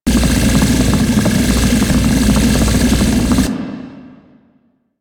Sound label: Laser Sweep Loop; Enemies; Weapons; Warframe; Corpus; Arachnoid Camper